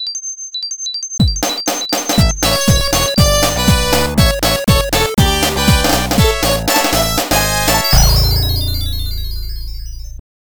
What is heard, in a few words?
Title Screen, genesis